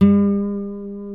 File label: A NYLON, Guitars